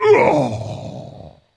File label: Wound Crit; Captain Rupert